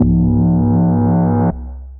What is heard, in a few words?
War Tone, Bass; Ableton; Skitter and Step